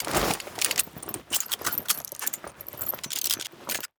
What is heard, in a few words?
tidy equipment